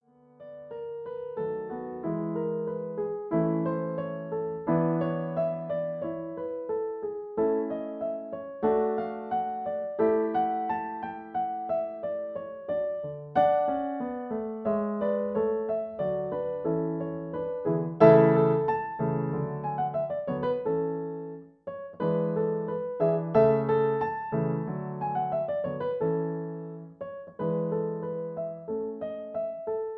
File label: Accompaniment A